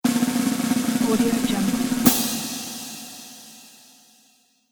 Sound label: snare drum roll 2 seconds